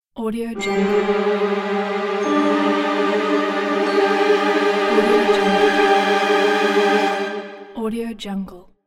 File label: cinematic tension strings builder; phillipmariani